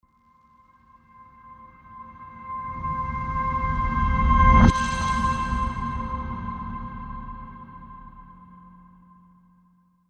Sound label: Tranquil Bell Reverse